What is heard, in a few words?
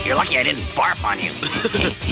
barf